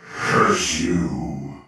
curse impact; weapons; dota; silencer

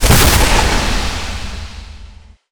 force cast; dota; furion

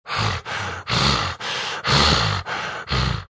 breathing; bloodseeker

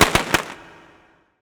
firecrackers; dota

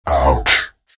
pain, vo; arc warden

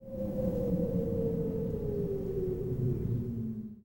move, movement; crystal maiden

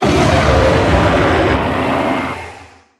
roar; barioth; dota; mh event

